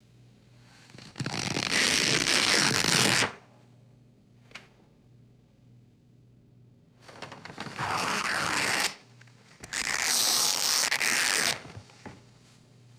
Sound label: slow tearing of thick paper